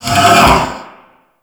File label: Obstacle Course Pillar Rise; Warframe; Clan Dojo